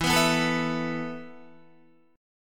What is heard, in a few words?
E Suspended 4th E, down, Guitar, 4F,4A,5C,5G,5C,6E; Esus4 strummed